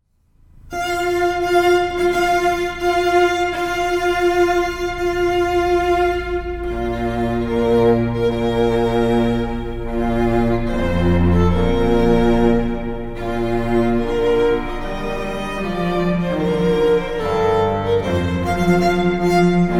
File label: Bridal Chorus, Duo Violin Cello